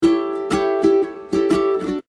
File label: instrument nukulele rhythm